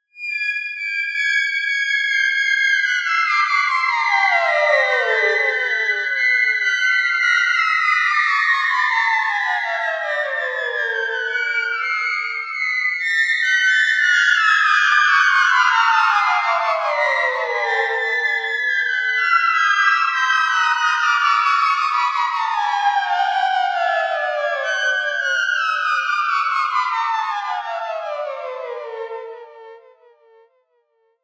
Bouncing abstract descending figures with tempo speeding within them, Miniature Theremin; ringtones